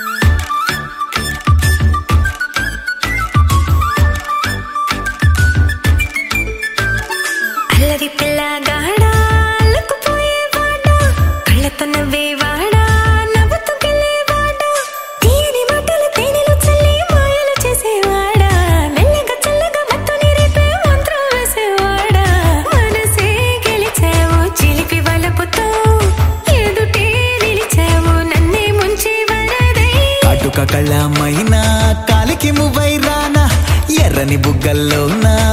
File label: Telugu Song Ringtone; Ungarala Rambabu